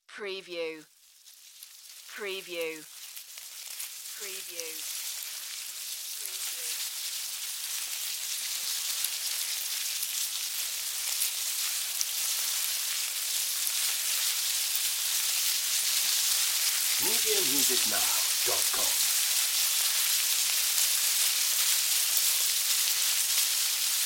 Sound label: Ant Army Movement; sound effects bible